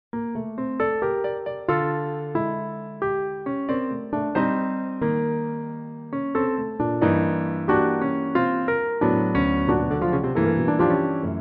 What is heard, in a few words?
Piano